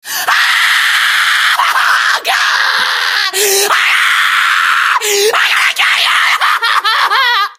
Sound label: scream